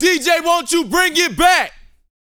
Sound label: VOX, Vocal, R&B Dance, HIP-HOP; Spectrasonics Vocal Planet